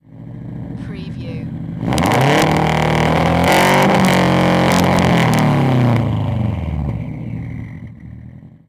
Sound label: SUBARU STI EXHAUST LONG REV